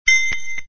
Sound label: ping